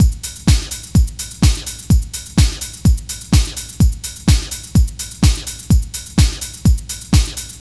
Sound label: LOOP, Tekno Industrial; East West